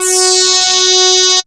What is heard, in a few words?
SWEEP E F#3; SEQUENCER; Best Service Alpha Dance vol.2